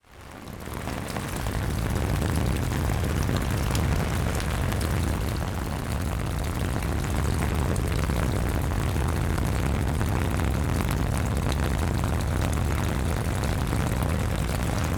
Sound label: rain and water from broken gutter pour on umbrella windy umbrella movement; zapsplat